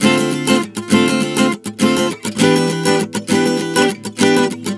Dm7-Em7 100 bpm, acoustic guitar chord progressions